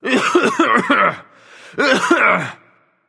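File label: nonverbal clear throat; smite; voicepack; merlin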